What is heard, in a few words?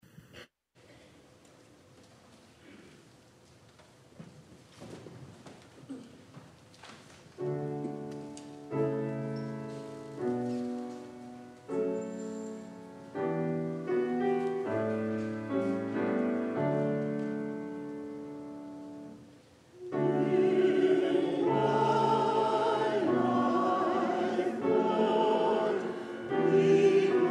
Anthem Ensemble